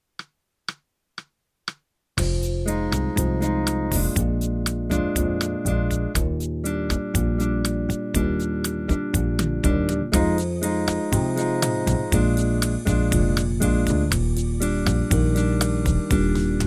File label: improv ii v i